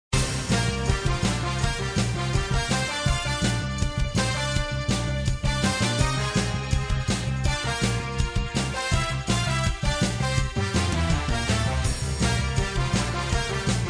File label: Free Spin Bgm; hockey